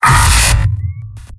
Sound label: m pain sharp; soldier; tf2; garrysmod; mvm